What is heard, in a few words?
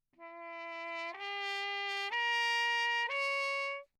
TRP MUTE Bach; Perinétventiltrompete